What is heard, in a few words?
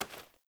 Generic Pickup; Objects; Pickups